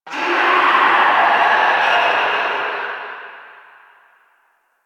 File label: Exterior Foreground Infested; Warframe; Ambience; Nineteen Ninety Nine